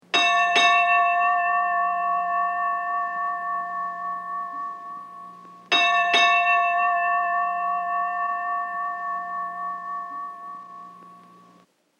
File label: Ship Bell 4 Rings; Boats; Vehicles